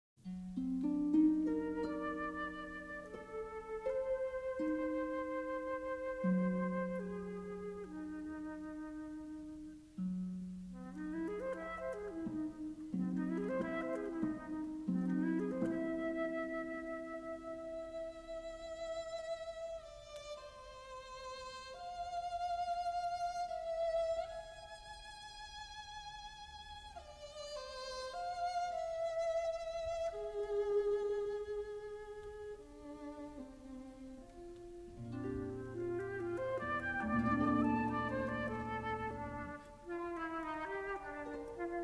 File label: Sonata For Flute, Viola and Harp Pastorale